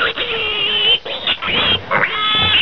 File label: pig squealing; Sound effects